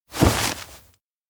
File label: nape grab; anemoi